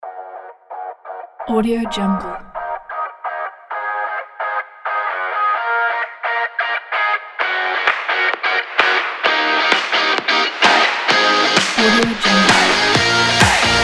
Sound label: sport fitness kit